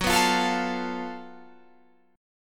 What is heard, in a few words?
F sharp Minor Major 7th Double Flat 5th